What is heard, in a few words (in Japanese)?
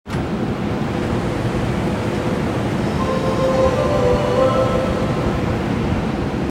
池袋線狭山ヶ丘東, train sounds; 西武